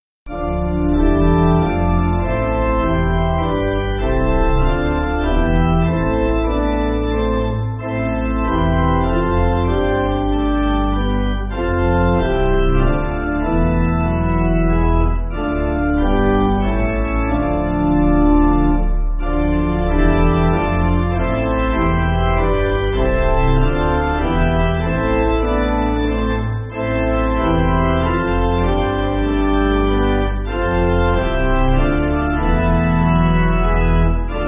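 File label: Pipe Organ, Backing Files for Churches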